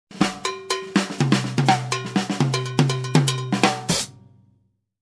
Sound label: Drum fill with Cowbells